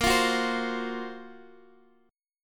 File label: F sharp Major 7th Flat 5th A sharp